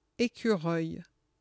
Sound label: squirrel; FoodChain; fr